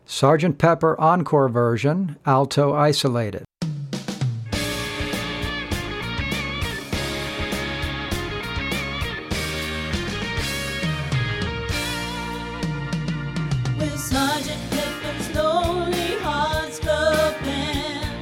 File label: ALTO ISOLATED; practice tracks for singers